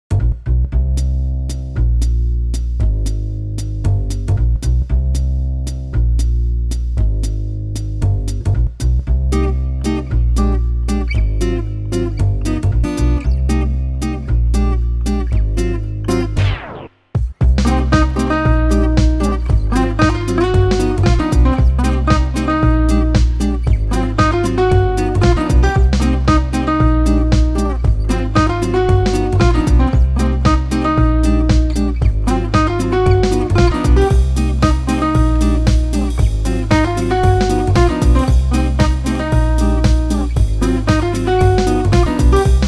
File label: upbeat jazz swing